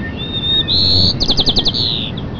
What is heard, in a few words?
nice bird